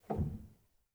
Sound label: rel, Grand Piano; bitKlavier Grand; Piano Bar